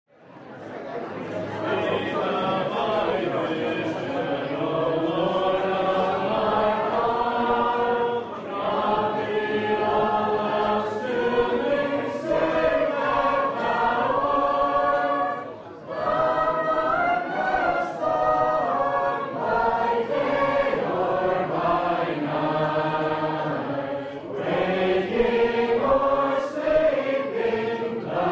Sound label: Be thou my Vision hymn, Hymn Sing; 2015Conference